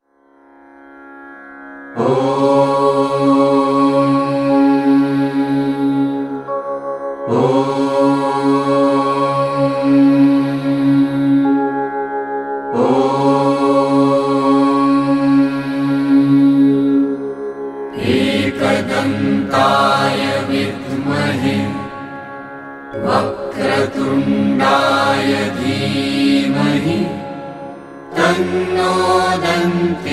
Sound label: Ganesh Gayatri mantra